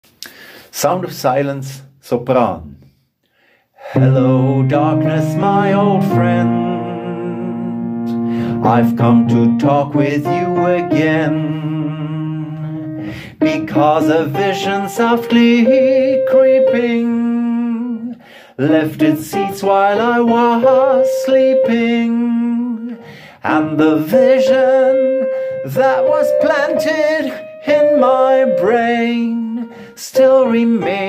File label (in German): Sopran